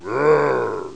ROAR

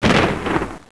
EXPL FAR; SHELL

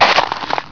crash

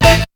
shot; BEAT2000

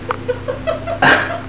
HIHIHI

